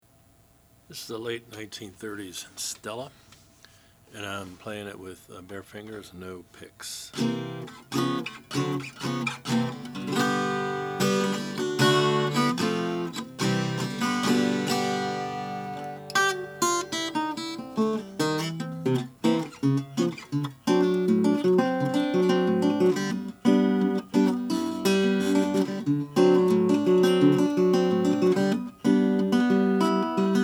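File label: stella concert sunburst, Guitars; 1939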